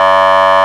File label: horn, sirens; federal sig ms4000